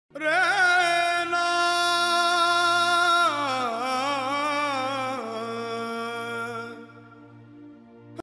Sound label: indian guy singing